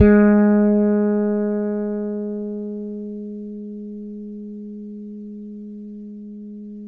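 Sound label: 6-string fretless bass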